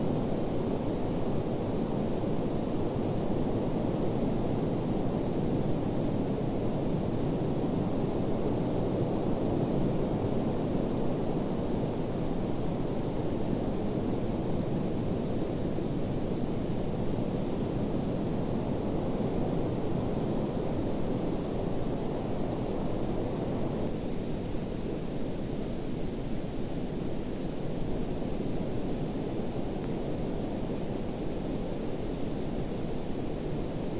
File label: WFR audio emfisis